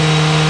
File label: Motor; Racer